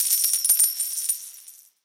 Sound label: big Win Coin Rain End; imperialriches